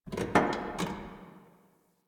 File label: Parking Lot Car Door